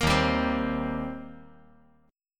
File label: Eb 6th Eb down